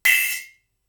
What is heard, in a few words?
Splash, drums singles; The Roots Drumloops